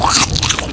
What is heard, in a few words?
bite, headcrab poison; hl2